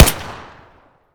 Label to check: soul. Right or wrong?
Wrong